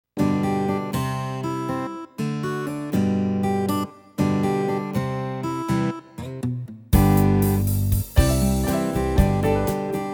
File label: Unplugged; Tyros Style